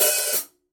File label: hi-hat open